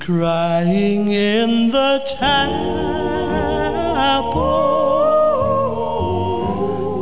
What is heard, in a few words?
crying